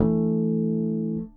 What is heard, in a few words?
Harmonic One Shot EA, Bass; Bass X